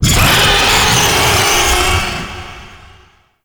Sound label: Death Vocal; Cinematics; Archon Death; Archon Boreal